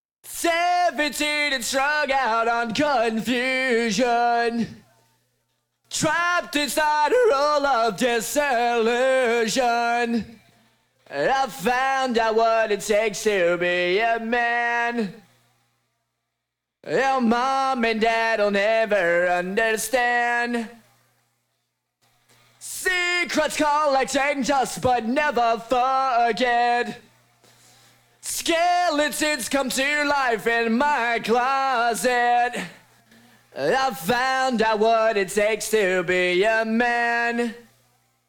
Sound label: Vocals; multitrack